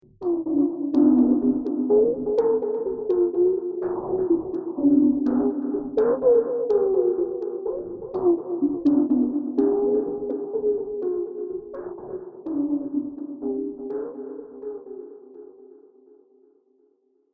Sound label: Sci Fi Clanger Ambient; Science Fiction Sound Effects